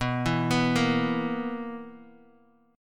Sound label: B Major 7th Suspended 4th, Guitar DADGAD chord